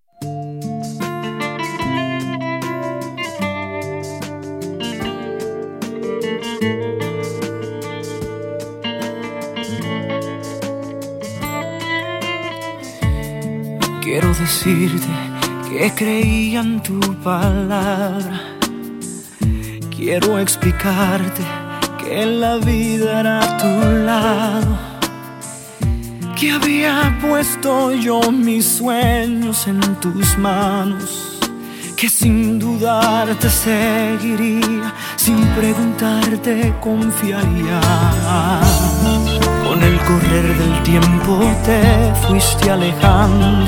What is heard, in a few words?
Latin 3 Step